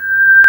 flute G5; Ableton Foundation FreePacks; Foundation Glitch Toys & LoFi

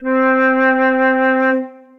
Concert Flute Solo Legato Vibrato; Ableton; Orchestral Woodwinds

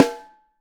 SN3 Top, snare; Ableton Foundation FreePacks; Snare Designer

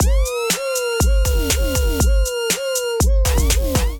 Kit; Drums; Miami Heat; Ableton; Build and Drop

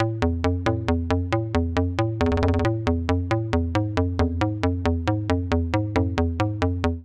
A Minor 136 bpm, percussion & FX; MIDI Clips; Tonal; Test Tube; Ableton; Skitter and Step

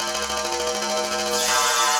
Alien Invasion, sci-fi effects; C3-D#4; Ableton; Mood Reel

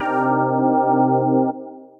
Fm Organ, Synth Lead; Ableton; Skitter and Step

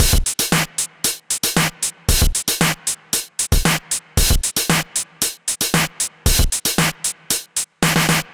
DMX 115 Groove, Drum Machines; Bust It; Ableton

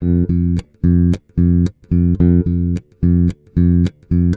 110 Pop Basic Bass F, E-Bass; Pop Rock

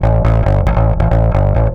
137 Garage Fuzz-Bass Am; Dance DJ

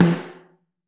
Snare Mono

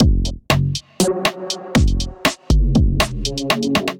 Kit, Drums; Freeside Kit; Ableton; Skitter and Step

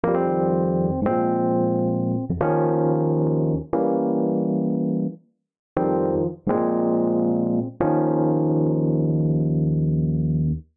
Eb major 94bpm; MIDI Clips; Accompaniment; Rcrd Plnt; Ableton; Factory Packs; Chop and Swing

